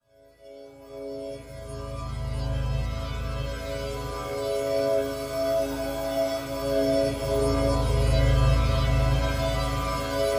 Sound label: Roland V-Synth Metal On Metal; Factory Patches